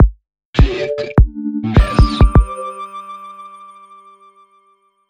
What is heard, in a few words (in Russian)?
сбивка; audiobrending dlya podcasta